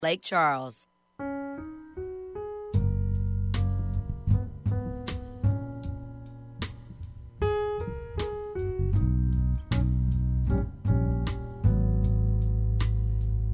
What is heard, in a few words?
jazz